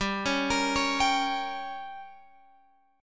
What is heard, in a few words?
G Diminished, Guitar, Open G; 17,18,20,18,20,x; down